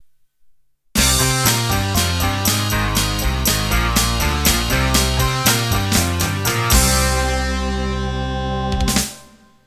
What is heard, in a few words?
Open Rock 8 End